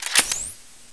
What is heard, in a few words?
laser reload, weapons